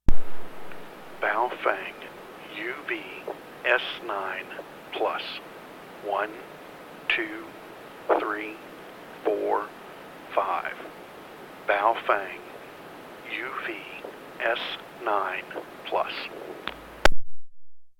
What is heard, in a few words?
baofeng uvs9plus